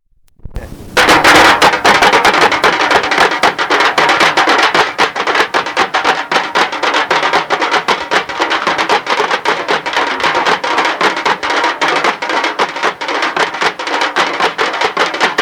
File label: tamb; Tambours malbars, 1mn49; Indes réunionnaises; enregistré au cours du Dîpavalî 1999 à Saint-André